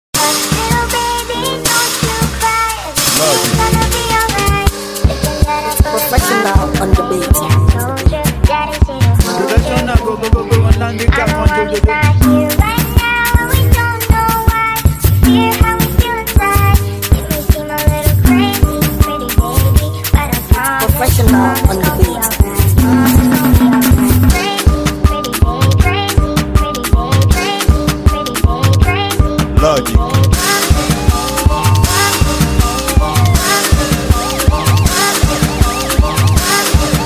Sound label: Professional beat ogba beat